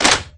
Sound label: Open; Ruu Goblin Quest